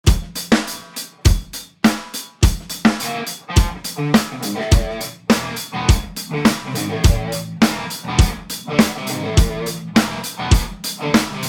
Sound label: drums